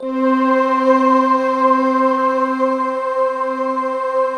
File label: future choir, Band; Optical Media International; Sonic Images Library